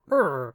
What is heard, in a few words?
Male grunt